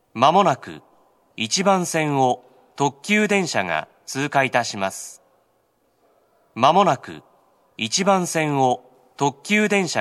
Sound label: pass limited express; hiyoshi; station